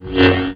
lt saber swing; MOTS

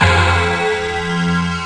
POWER CH; Easy Personal Web Page

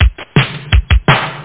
drum rift

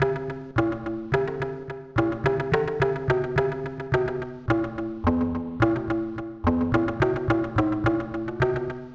tamtam

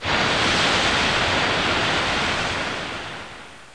waves; Sun on Ocean; camtasia; Menu Maker

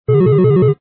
WARNING; water

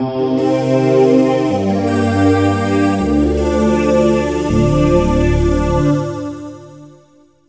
SYNTH PAD AMBIENT 160 C, Ambient; eJay; Sphere

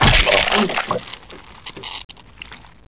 gib; SINDEMO; player; blade

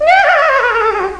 laff; Swimwear Illustrated

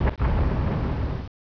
Explosion Far; F⁄A-18 Hornet; F/A-18 Hornet 2.0 Demo

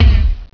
bounce

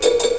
click

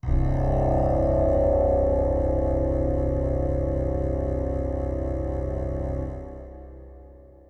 synth pad ambient 160 C; Sphere

